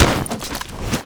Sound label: Hit And Roll; Archwing Deploy; Archwing To Roll; Warframe; Cinematics; Rail Jack